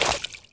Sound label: fishing pull; mir2; crystal